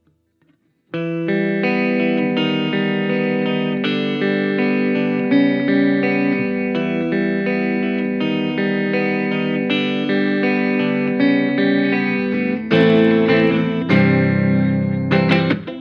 12 String Clean Neck

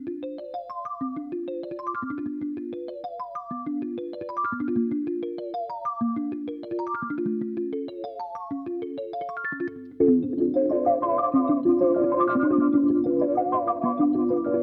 Volca FM

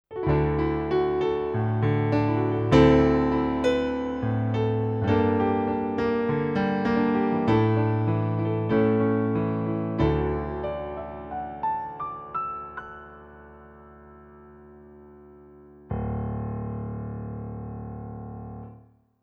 Montage CFX

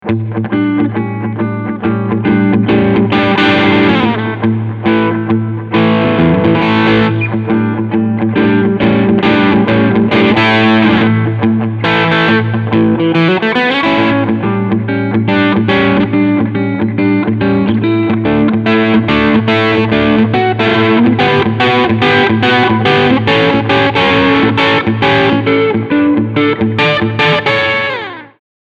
Crunch Steg Humbucker

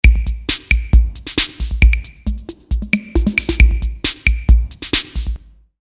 Ace Tone Drum Cave

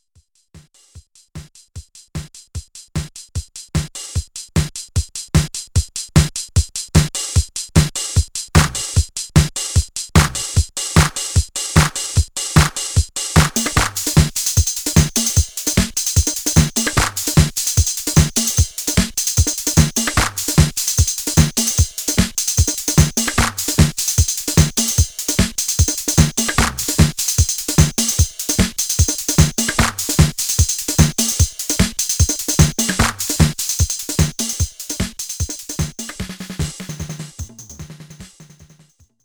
Wersi CX2 dry Disco

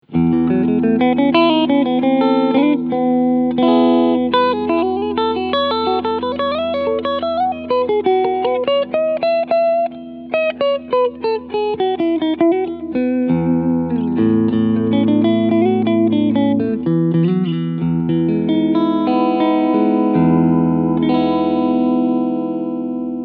Front P90 Clean

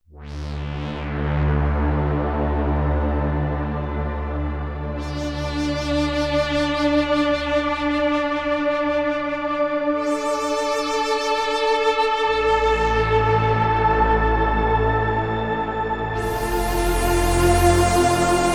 Flutterbies Pad

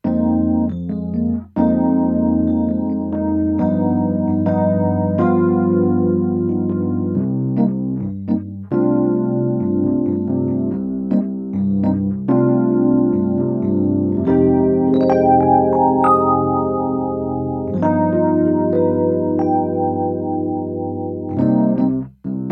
Electric Piano Stereo Chorus

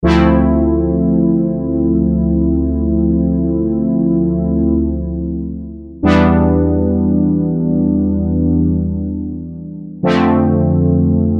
SX240 STRINGS PURE SOLO

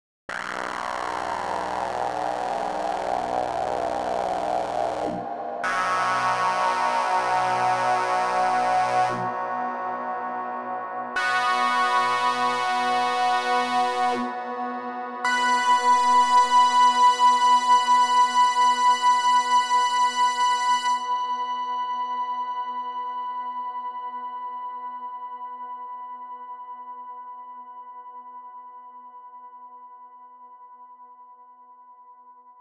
Plate Clean Mix50 Decay 16s; SuperPlate; E-Plate III

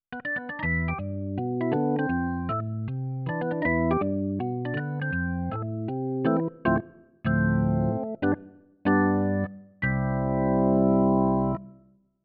CN34 Organ

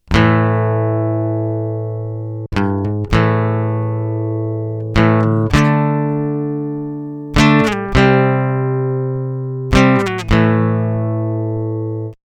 ovation elite

